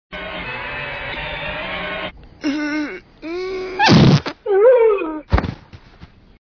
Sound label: dog